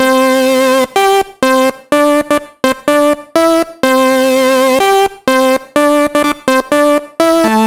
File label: Synth